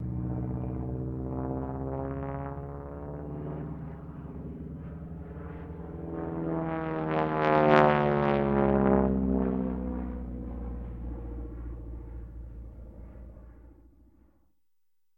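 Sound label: Harvard Single Engine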